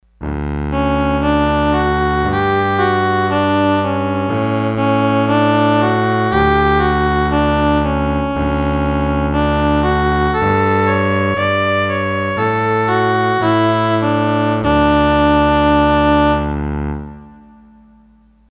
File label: Contrabass Cello, Roland D-10; Factory Patches